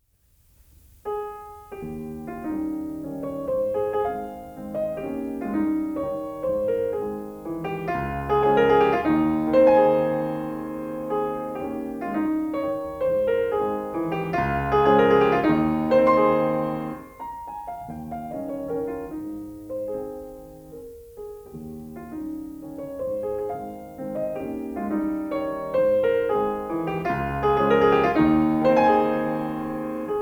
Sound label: Mazurka; Chopin; Kapell; The collection of mazurkas